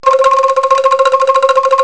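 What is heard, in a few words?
angklung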